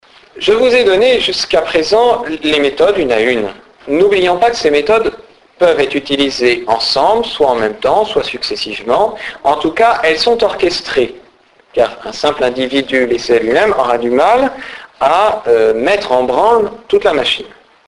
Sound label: Orchestration; Chautard; Chardonnet manipulateur